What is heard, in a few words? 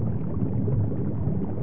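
underwater; bass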